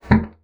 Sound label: bassoon slap; Xsample VOL-6; C BASSOON